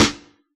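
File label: hip hop kit snare; Dr 202 Kit 1; Percussion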